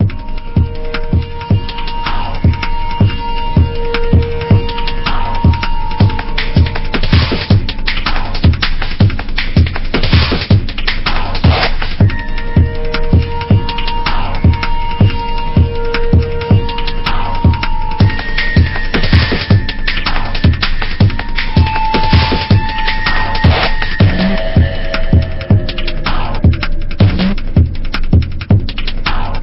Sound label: Swamp Background; Pixel Gun 3D